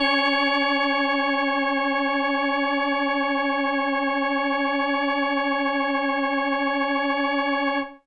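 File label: Indie Organ Kawaii C#1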